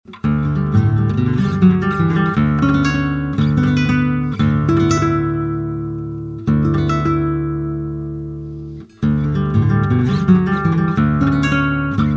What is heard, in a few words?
Classical; cascade FAT HEAD